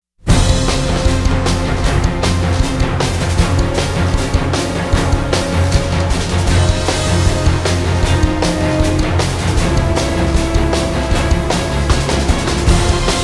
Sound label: (No Choir), Orchestra